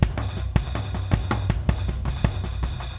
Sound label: music; RapidTyping Tutor